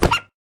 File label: Hit Bush Wolf; Lethal Company